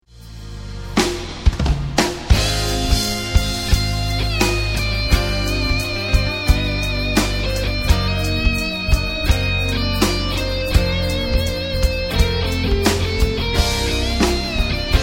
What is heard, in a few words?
Gtr Solo